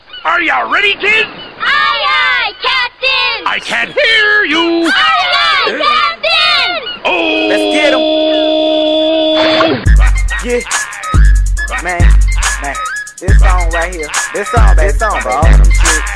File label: Hiphop; Spongebob